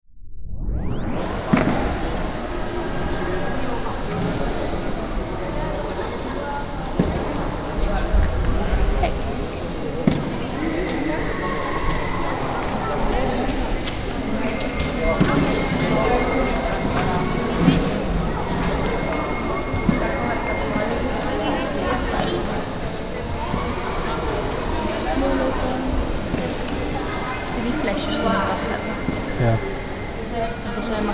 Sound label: fieldrecording random mystery ambiance; weeklybeats-2020